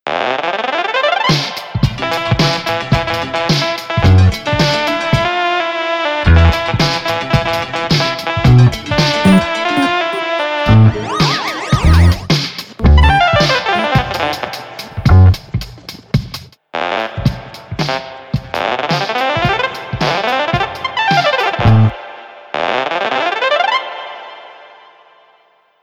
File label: borg vintage; The Sound Projector radio show